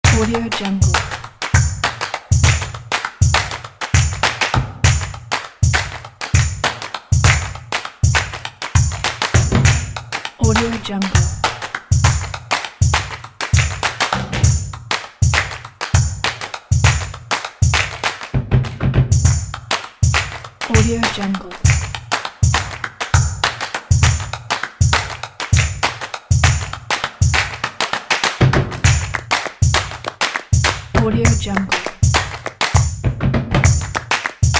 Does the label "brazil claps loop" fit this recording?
Yes